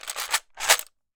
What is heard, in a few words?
dice